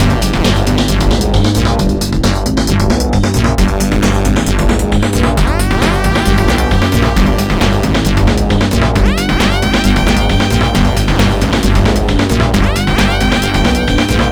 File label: Trancefunk Mutator c, Hardcore Breakbeat 134 F#min; Big Fish Audio; Hardcore Breakbeat Action